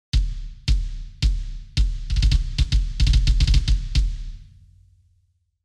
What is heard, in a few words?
yamaha 9000 kicks; klangmacht